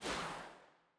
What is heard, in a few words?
ambience room shadow room assassin block open